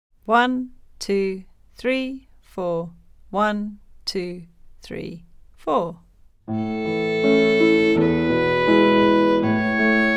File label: A major scale, violin